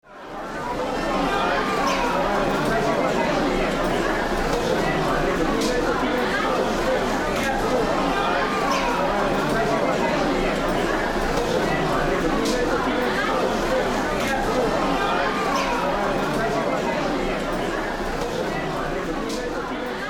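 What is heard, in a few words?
ambience hitchhike; Wario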